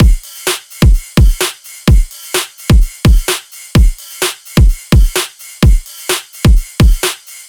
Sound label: Shuffle Full Drum Loop - 128 BPM; Drums; Loops; House; Cymatics; House Starter Pack